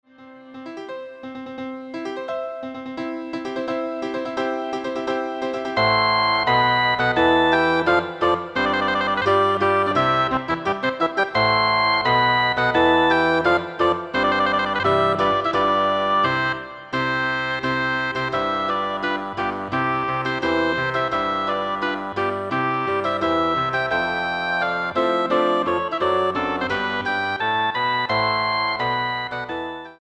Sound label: Oboe Bassoon